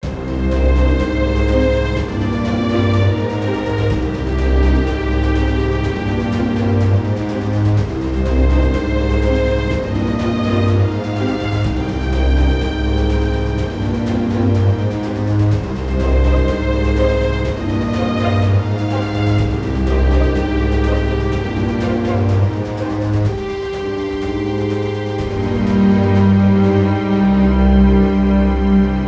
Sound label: Intense